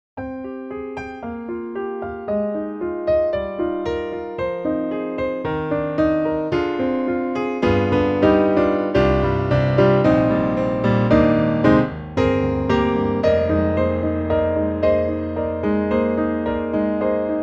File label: Cine Piano, Dry and Close; Cinesamples